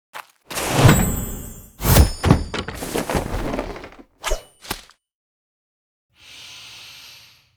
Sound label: recall windup; LoL; ashe skin06